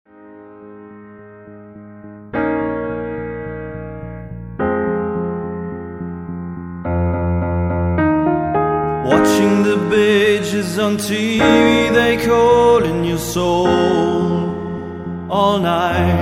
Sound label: piano